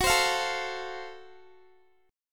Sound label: Gb Minor Major 7th Flat 5th Gb Guitar; strummed